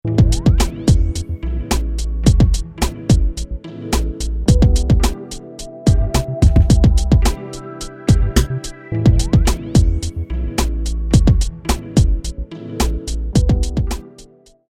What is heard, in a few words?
tripout